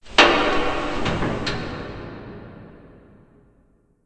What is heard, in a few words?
mall random, ambient